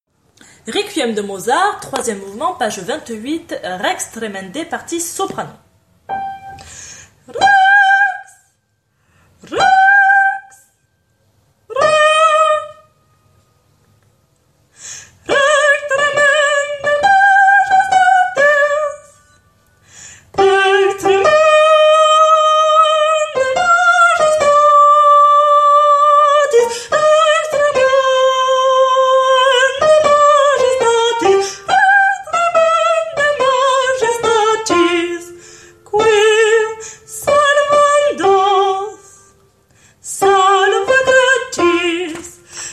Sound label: Soprano; Requiem; Rex Tremendae; Mozart